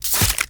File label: Arch Gun Primary Beam Impact Flesh; Weapons; Warframe; Archwing; Hildryn